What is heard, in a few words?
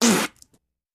Spitting water; coughing & spitting